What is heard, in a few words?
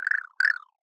Roar; Mung